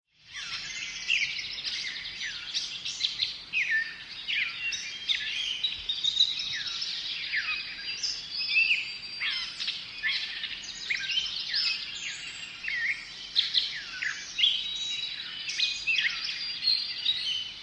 ANIMALS-BIRD BGS RURAL NEW YORK: May dawn, populous & varying bird calls of varying distance and spatial reverb